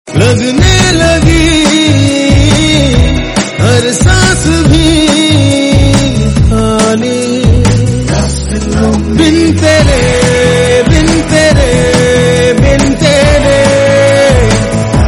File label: ringtone; hindi ringtones